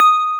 BELL SYN, SYNTHESIZER; AKAI S6000 CD-ROM - Volume 1

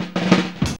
FILL 96, Funky Elements LOOPS; Zero-G

